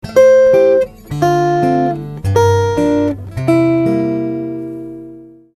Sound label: walz